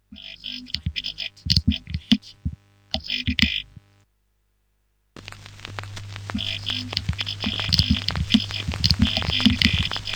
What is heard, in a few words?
stereo 2 instr loop; rauschpartikel